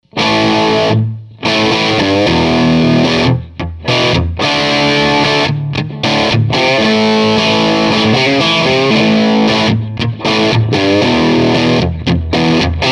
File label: bright crunch